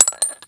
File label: ammo casings